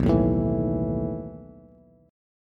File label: B 11th B down Bass; B11 strummed; chord shape; JGuitar